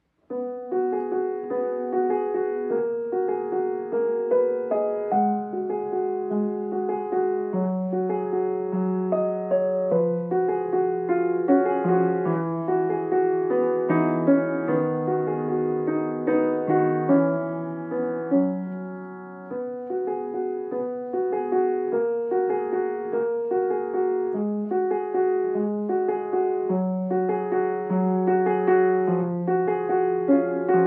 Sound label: piano diary